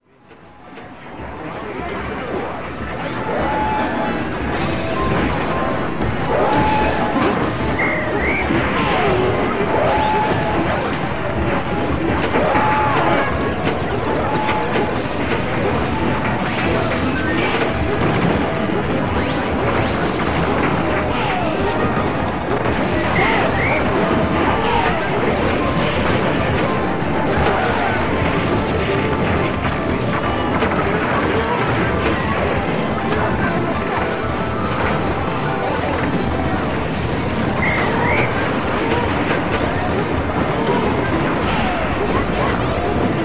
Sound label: arcade ambience